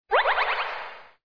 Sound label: ration, ringtones; Metal Gear Solid